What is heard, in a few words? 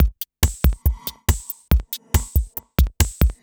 electro beats 140